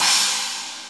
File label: Cymbals Normal